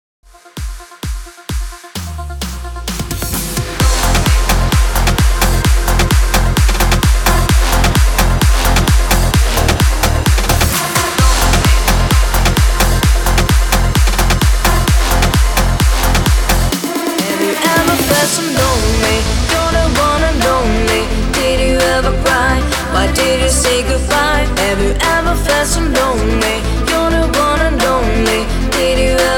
big room, 130 BPM

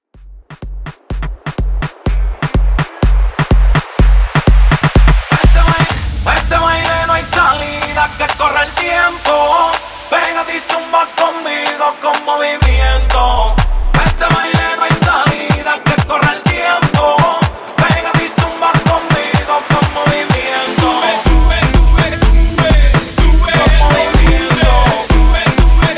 Hype Moombah 125Bpm

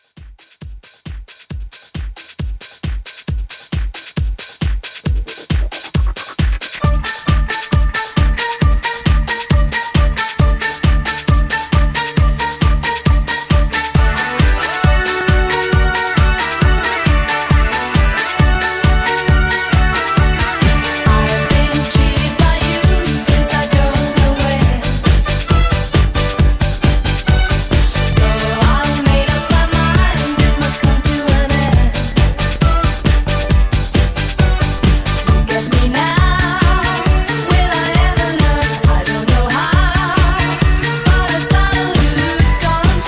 70s Pop Re Drum 135

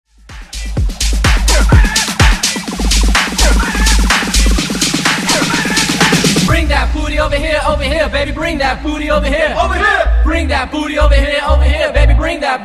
Funk Bootleg 126Bpm

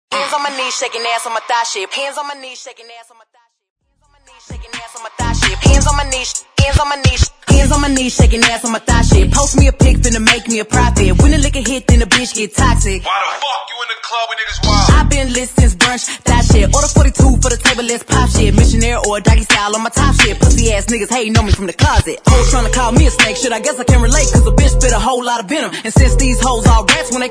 Acap

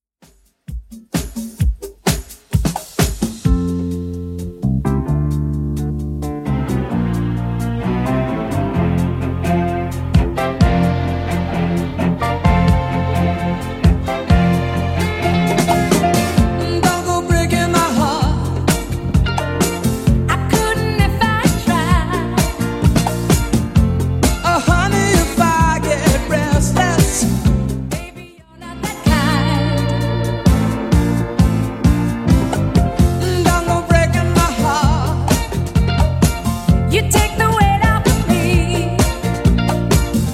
70s 130bpm; Xtend Edit

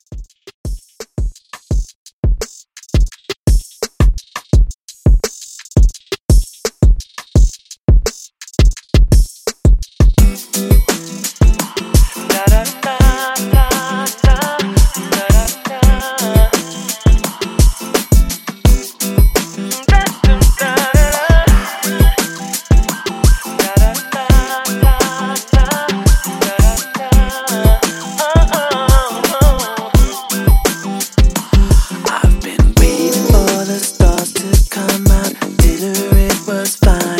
Pop Redrum 85Bpm

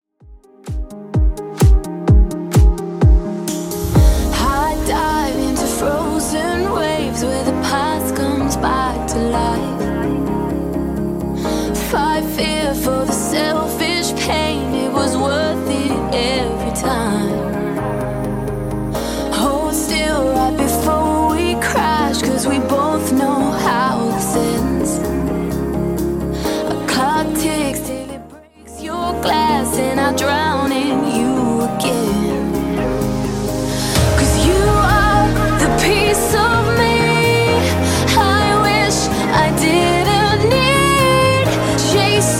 2ks Redrum 128bpm

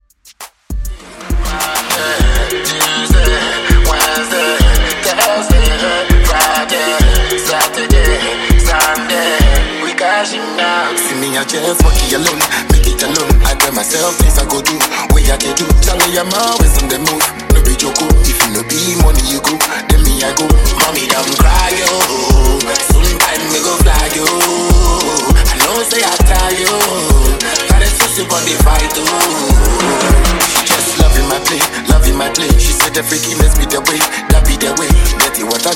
Top40 Re Drum In Outro 100 BPM

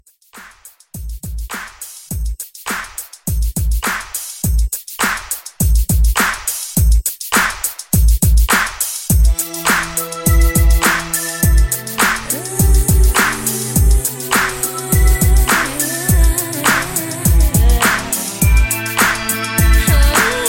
90s RnB Re-Drum 103

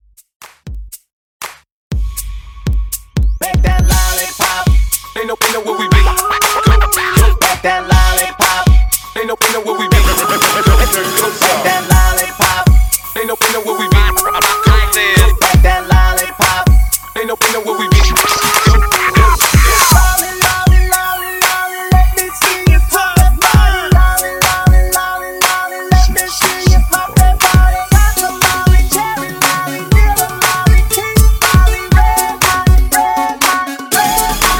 Throwback Party Break Scratch 120 BPM

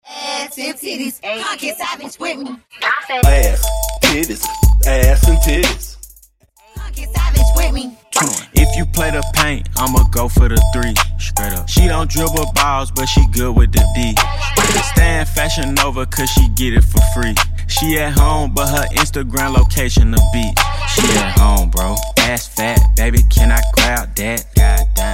Acap Intro Outro Ext; Redrum

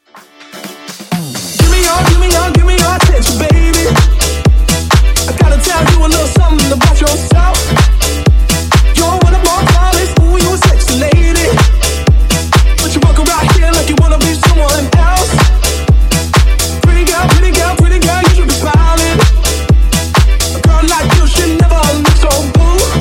Funk Remix 128Bpm

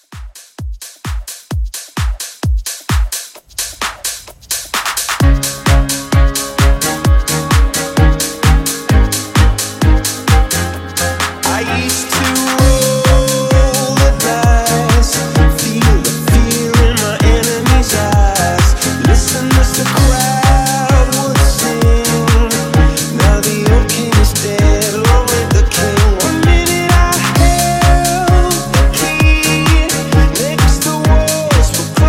House Re Drum

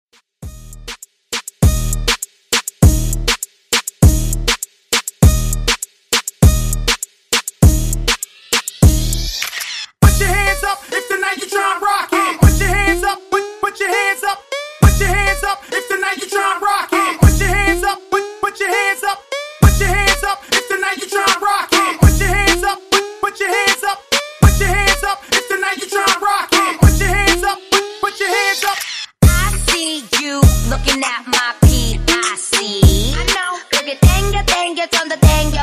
Top40 Moombah Hype Redrum 100 Bpm

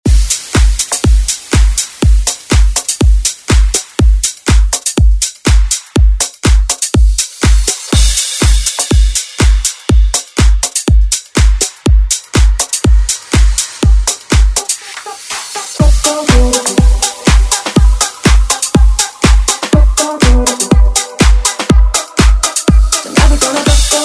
Dance Redrum

